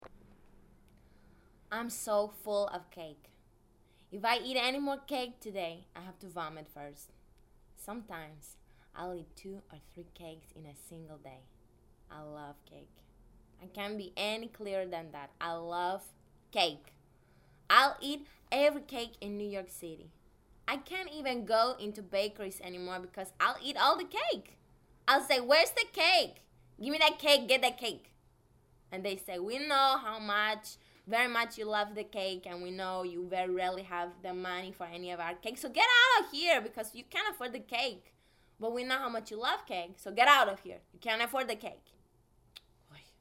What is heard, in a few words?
fake Brazilian accent; cake covers